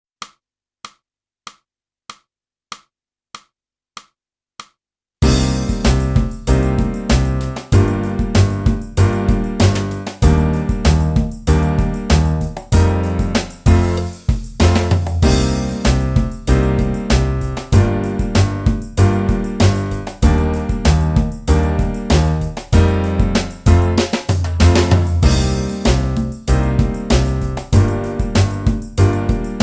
flute; boosey

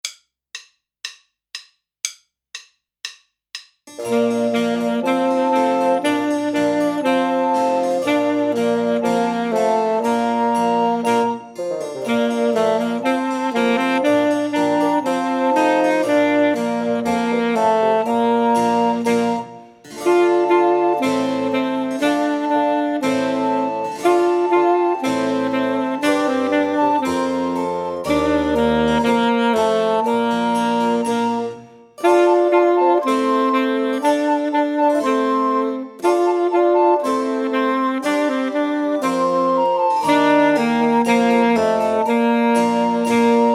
Allemande Full Sax

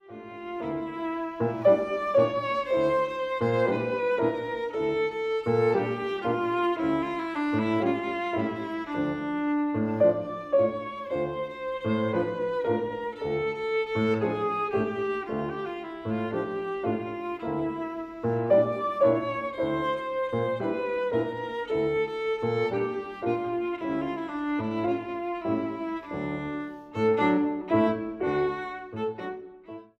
VIOLIN

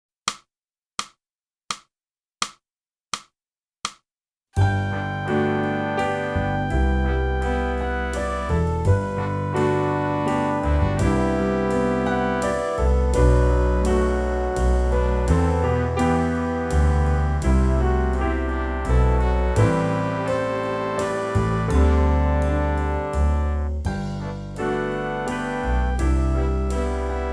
clarinet a sad tale

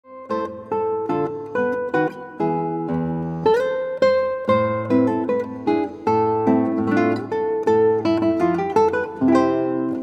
Guitar

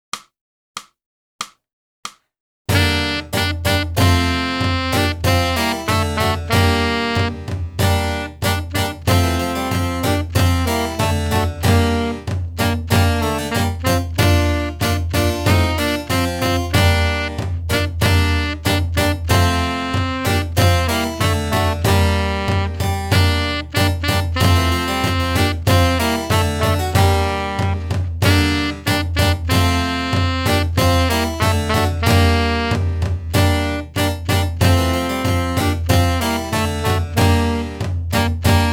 sax

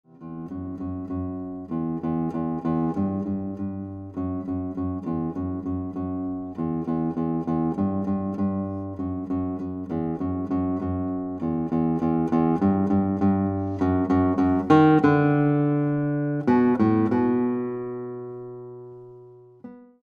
Guitar

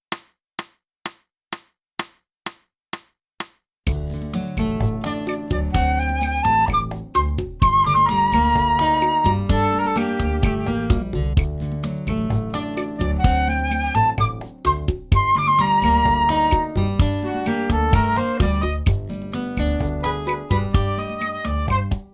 flute; (Morgan) - Boosey Woodwind Method: Flute (Book 2) (Book & CD)

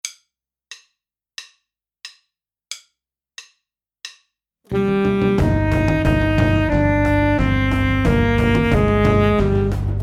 Melody In Phyrgian Mode Full Sax

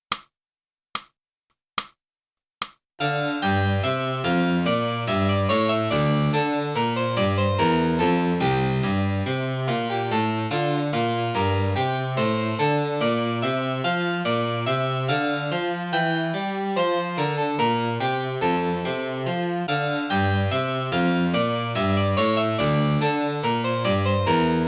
clarinet bourree

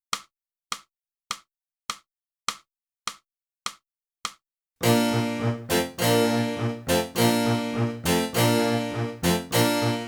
flute, autumn

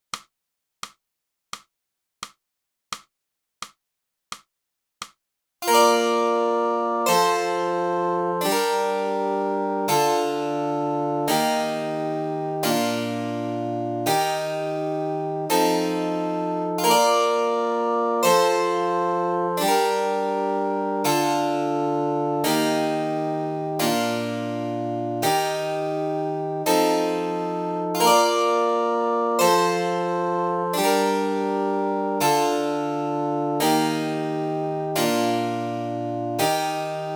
sax do it yourself

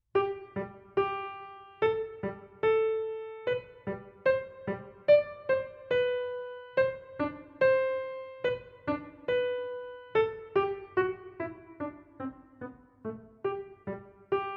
piano

